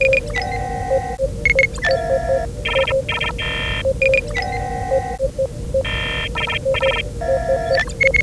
console

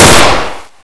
famas burst; firearms

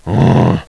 hit; brainbread

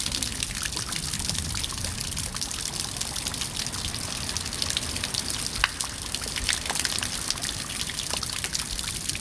drippage; svencoop

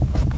wood

